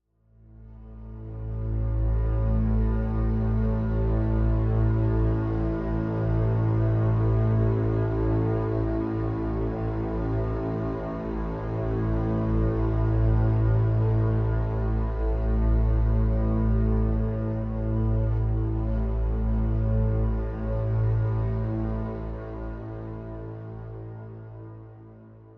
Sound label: Low Strings